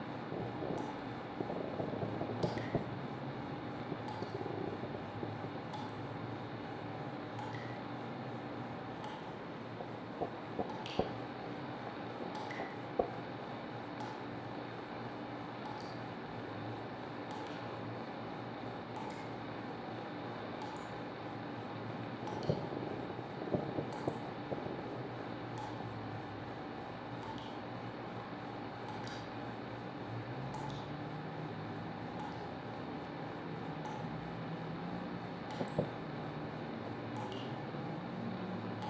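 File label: Ambience Apartment; Beholder 2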